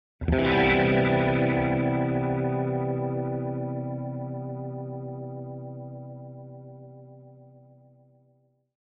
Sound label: Guitar Leslie Major Chord; Hollywood Edge; sound designer's toolkit v2